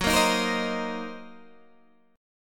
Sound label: Gb 6th Flat 5th Gb down Guitar DADGAD